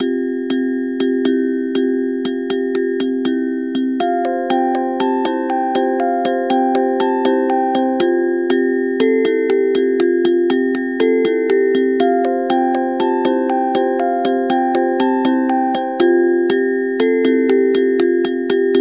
Music Box Mix

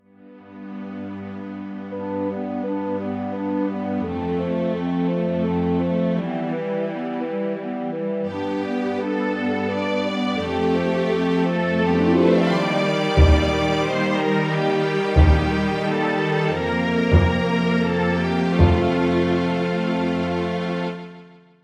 Howl Song; the legend of zelda twilight princess

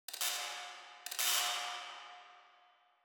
City SFX, Console Broken Sparks

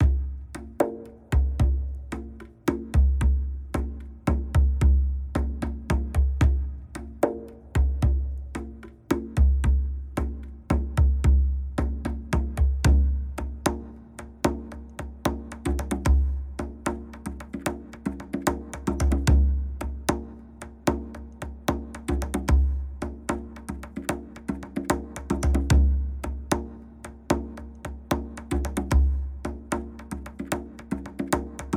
The Power (Drum)

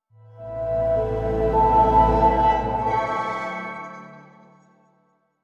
Music Logo; Bacterium

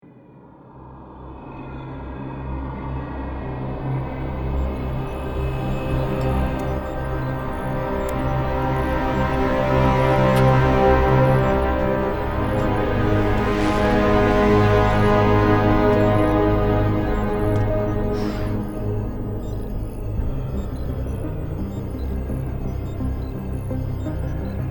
plans & preps sfx music; Deus Ex Human Revolution

